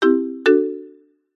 multimedia game sound slot machine mallet chime positive win